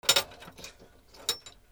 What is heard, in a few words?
PHONES